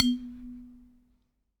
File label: Aluminium Tube Hit